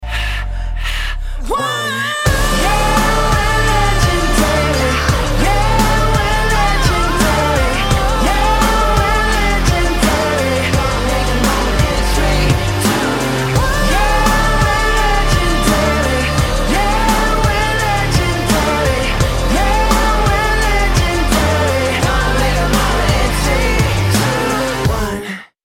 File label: Sample Singing, female singers